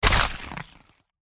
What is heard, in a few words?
panther attack contact